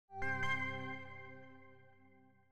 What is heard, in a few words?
stinger